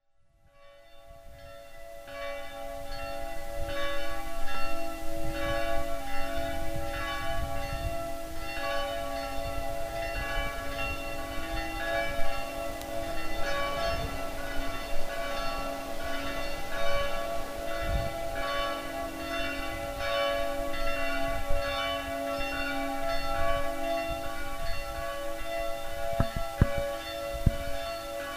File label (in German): glocken; menningen